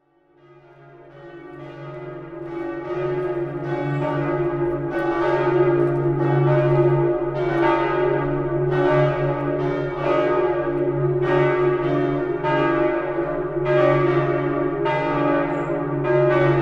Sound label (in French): Cloches Bells Cathedrale St Etienne Limoges France